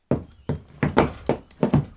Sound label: suicide step; barbarossa